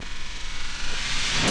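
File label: cymbal, 160 BPM, drum & bass; Ueberschall; Drum & Bass Flash Trackz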